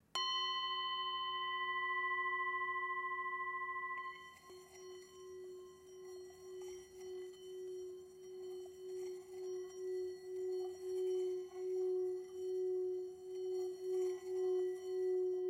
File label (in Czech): tibetska misa